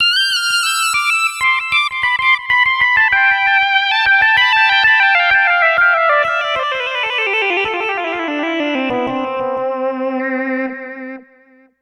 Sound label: 80s Lead, Riffs & Solos; Funk; Sonic Foundry; Dr. Fink's Funk Factory